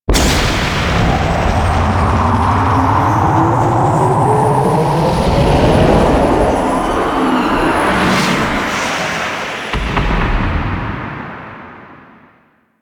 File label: Spawn Bridge Take Off A; Warframe; Corpus Infested